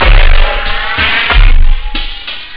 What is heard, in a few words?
drumloop; awe32